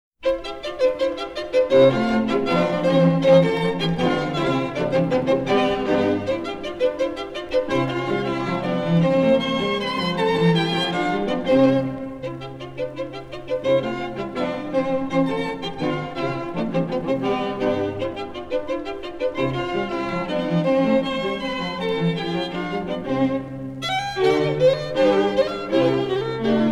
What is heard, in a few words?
String Quartet; Mozart; String Quartet No4 K157; Pascal String Quartet